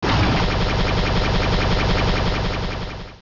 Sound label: Tri Attack; battle anims; PokeRogue